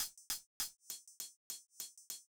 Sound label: clicks and hi hat; Multitrack